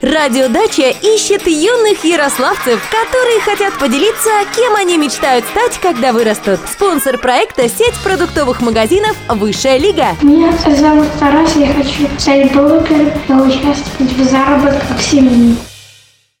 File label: child